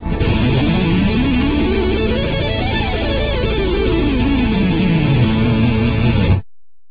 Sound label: mixolydian fast